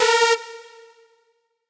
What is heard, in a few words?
synth